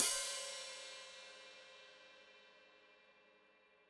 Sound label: Hat Foot Open, Hi-Hat